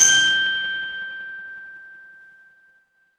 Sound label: instrument chime bar